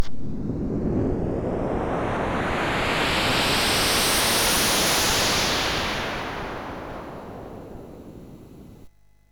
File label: sid MOS6581R2; Conquestador